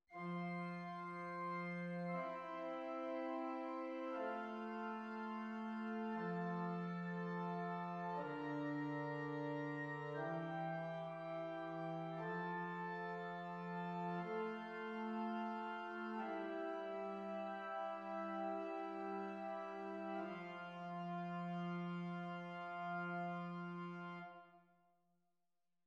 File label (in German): Zwei simmiger Contrapunkt; Ronge